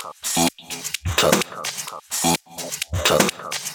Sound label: Electro Loop 128 BPM; Vengeance Samples